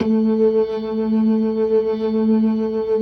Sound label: LO MAN V R, ORGAN LOWER MANUAL; Keith Emerson Signature Series